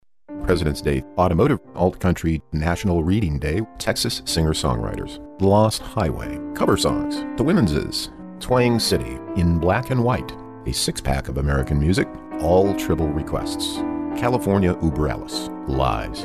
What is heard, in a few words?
Piano; CLAR100